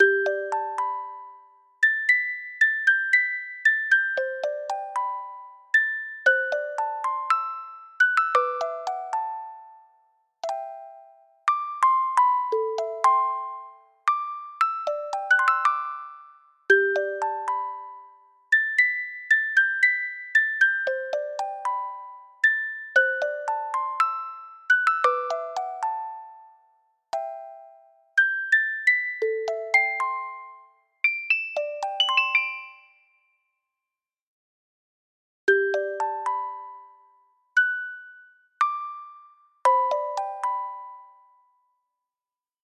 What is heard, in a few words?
Love Song, Music Box; animal crossing new leaf welcome amiibo soundtrack